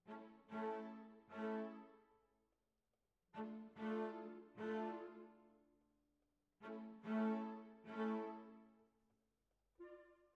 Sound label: Cellos